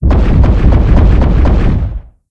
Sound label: Robot Walk; Kaiten Robot; blue archive